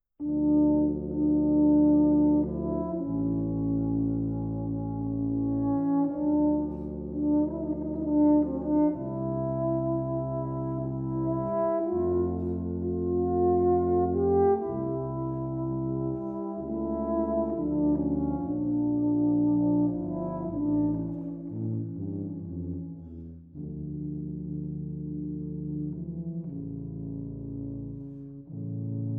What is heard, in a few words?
Tuba Trio